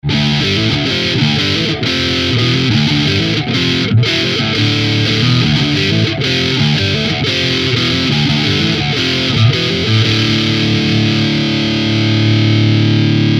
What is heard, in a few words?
riffs; Schecter EMG